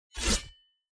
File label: crusader strike sword